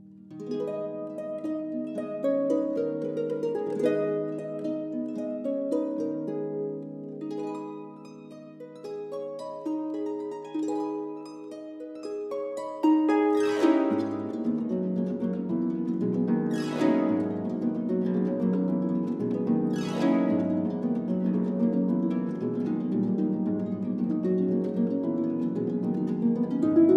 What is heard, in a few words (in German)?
Baroque Flamenco, Harfe